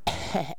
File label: uh huh huh